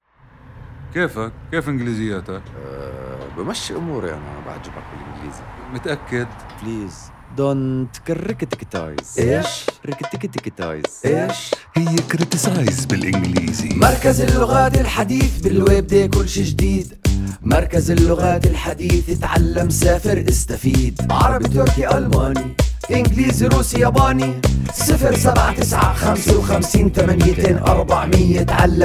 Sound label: Jingle; MLC; مركز اللغات الحديث - Modern Language Center